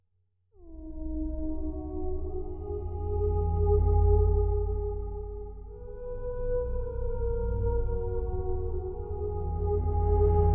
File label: Choral Strings